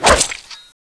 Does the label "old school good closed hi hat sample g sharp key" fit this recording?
No